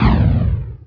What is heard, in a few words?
teleport